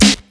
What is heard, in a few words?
dirty snare drum g sharp key; Mono